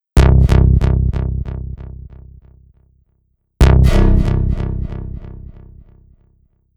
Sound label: Sci Fi; техно транс